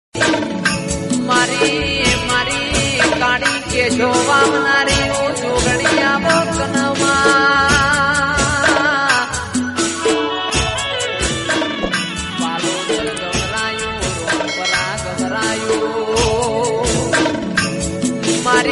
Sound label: bhakti Gujarati